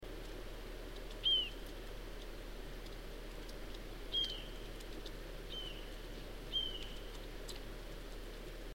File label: marbled murrelets